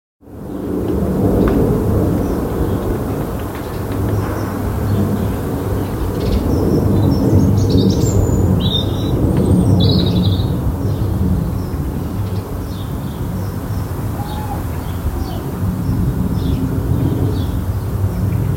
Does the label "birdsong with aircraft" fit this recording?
Yes